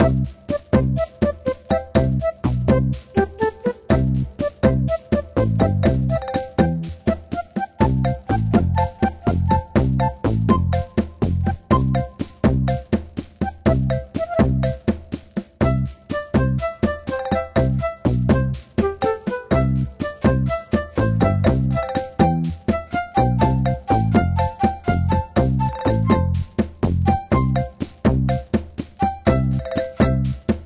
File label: menu music